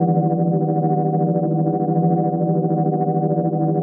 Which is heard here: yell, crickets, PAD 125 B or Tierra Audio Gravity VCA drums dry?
PAD 125 B